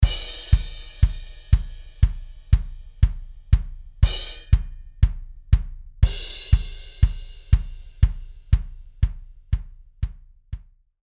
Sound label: Maple Kick; DrumWerks